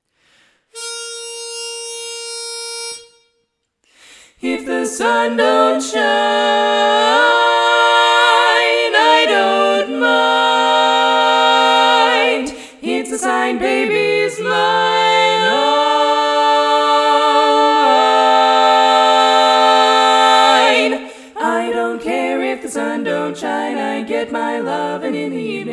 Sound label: bari omit